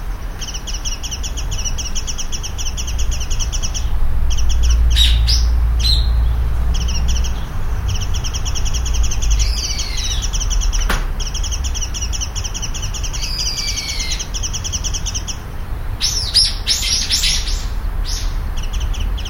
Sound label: mejiro